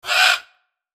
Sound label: Ara